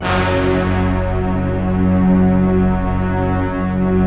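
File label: F#2, STACCATO ORCHESTRA; Best Service; Advanced Media Trax Modern Composer; STACCORCH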